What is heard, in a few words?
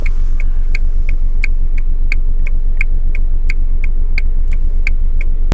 car signal